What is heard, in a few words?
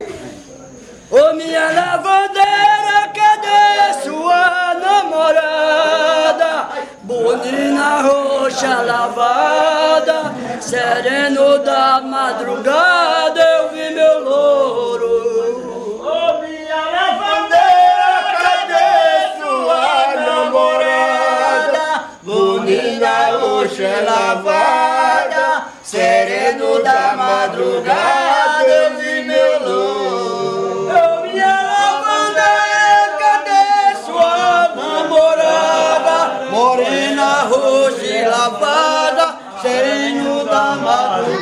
kariri xoco